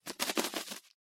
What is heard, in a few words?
Bird Wings Fly Away; SoundsCrate